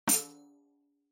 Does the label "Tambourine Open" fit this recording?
Yes